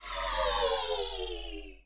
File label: Fighter Hurt; Sid Meier's Civilization 4 Complete; Beyond the Sword; Final Frontier; Units